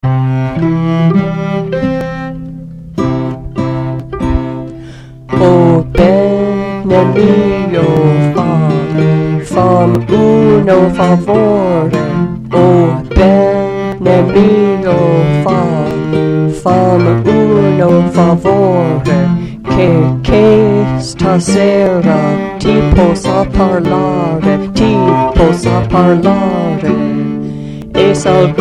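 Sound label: Bass Words; O Bene Mio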